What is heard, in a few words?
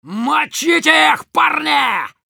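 battle cry, Russian; Demoman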